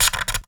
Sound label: Polystyrene Squeak